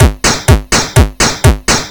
C64 Disco Loop, chiptune drums; Commodore 64

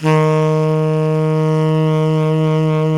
SAX Tenor Breathy D#3

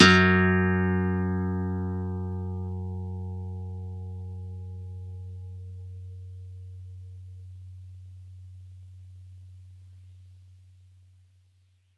F#2, Campfire Nylon guitar; Worra's Campfire Nylon

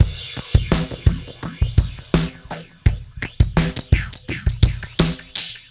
dub 084, Beat Loops; Zero-G; Monster Beats

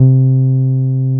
TB 303; VEL SW SET

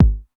KIK KIK, TR909 Kicks; Club-50 - Foundations Roland; Cheez Kiks

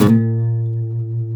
B, Martin, guitars; Spectrasonics; Hans Zimmer Guitars VOL-1; MARTIN HAMMR

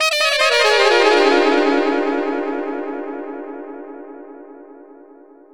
Dubby Sax; Effected; Sonic Foundry (Sony Creative Software); Jazz Solos and Sections

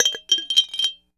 JAR CLINKE; Rarefaction; Poke in The Ear With A Sharp Stick

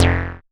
Bass, Synths, 5U Modular

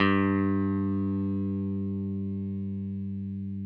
6STR BASS, Country; Sampleheads; Whole Lotta Country 1 & 2

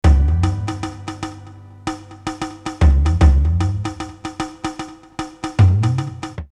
Okavango 76, drumloops World Ethnic; X-Static Goldmine

